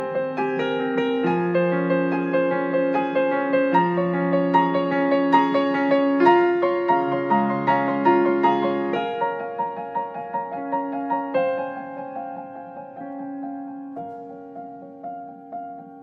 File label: piano music